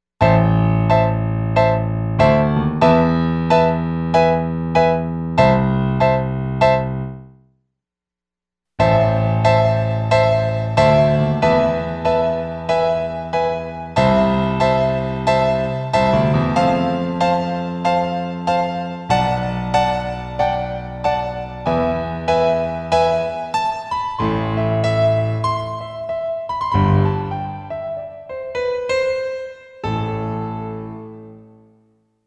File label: VOCAL II